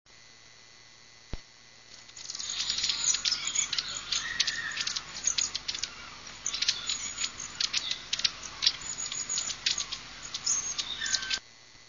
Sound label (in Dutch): staartmees